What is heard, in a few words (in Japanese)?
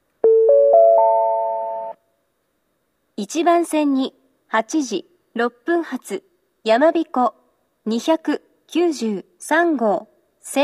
shiroishi zao 1 bansen sekkin, tohoku shinkansen sound